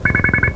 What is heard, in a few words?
25 CENT